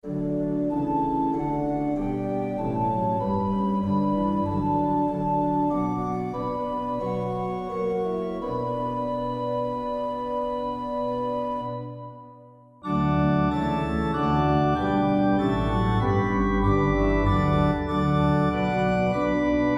organ, hymn; Hymnal 1982

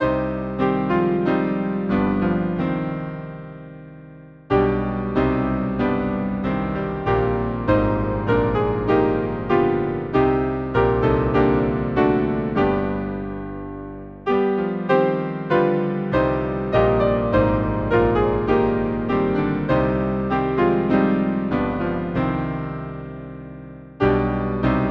piano, Bangor; Alone thou goest forth

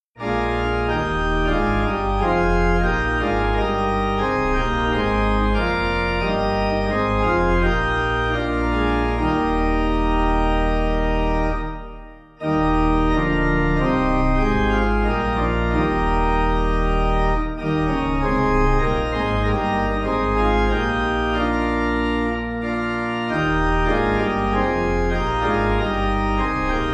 Hymn organ carlisle; Hymnal 1982

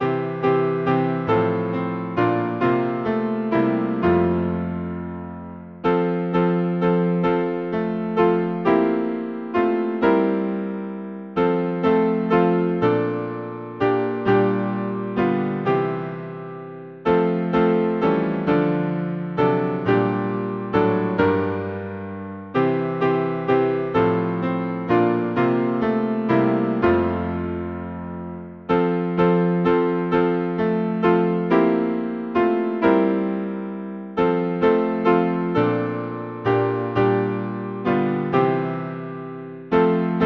piano, Hymn; Fight the good fight with all thy might; Pentecost

